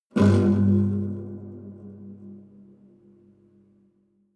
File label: low string buzz deep